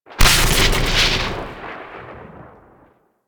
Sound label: Void Storm Pillar Lightning Strike; Warframe; Railjack